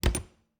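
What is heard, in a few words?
Refrigerator, old fashioned, wooden, steel, brass clasps, hinges, close; Eiravaein Works; Vaeyan IV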